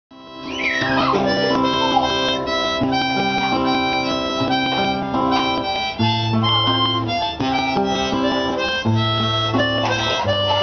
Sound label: Harmonica Guitar